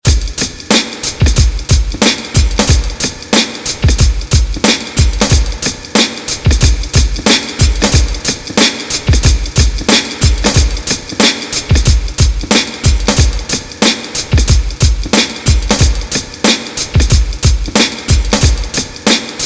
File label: rain